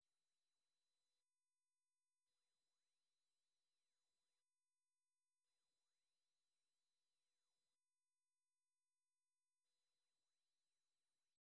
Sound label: 20khz test